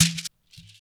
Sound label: Shekere; Spectrasonics - Supreme Beats - World Dance